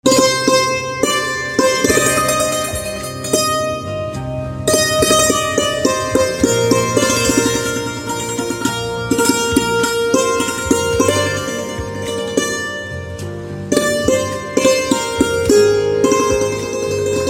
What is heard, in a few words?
Santoor